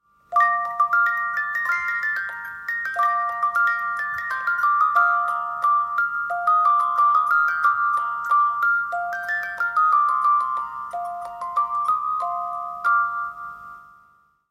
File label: classique bolero